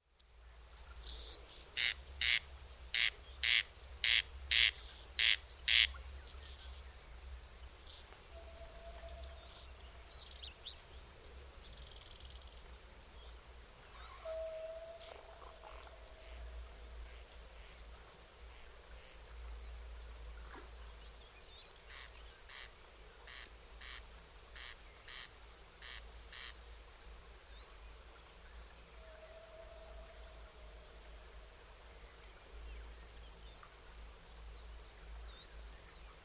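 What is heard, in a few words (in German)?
Wachtelkönig Murnauer Moos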